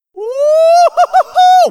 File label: win; freak fortress 2; some clean trash